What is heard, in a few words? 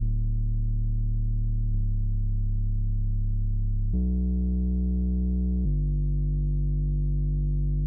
Dmaj 122BPM Bass; Monosounds; Coconut Coctail; PANDORA BOX!